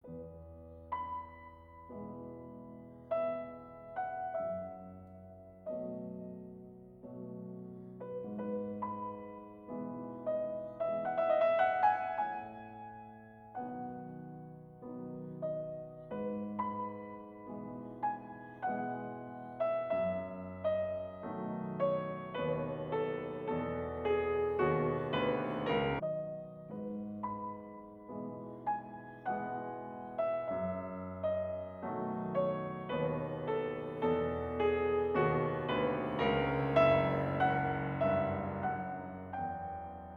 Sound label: Piano Sonata No 30 in E Major Variation 1 Molto espressivo